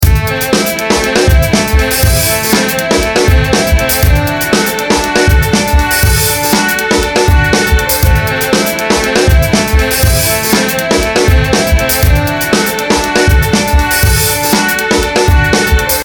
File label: melotron